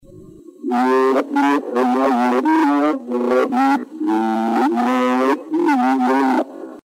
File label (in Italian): strilli